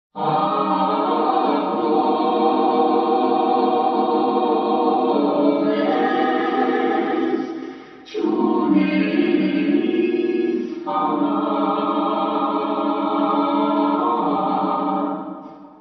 armenian morning chorus; aymenyan ergch akhowmb

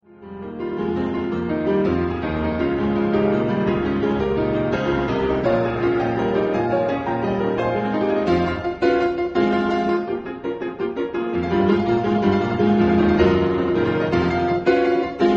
piano fighting; ffvii

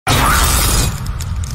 crack sound effect; gura gura no mi

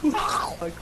gargle

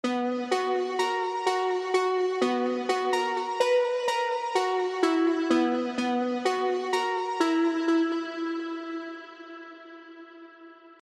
lute; sprunki oc

